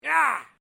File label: MALE OUCH; Male Vocal sounds; Pain; Voice